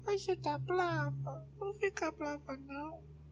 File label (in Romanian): miau; ce ta blava